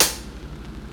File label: drumbreak hihat; Hiphop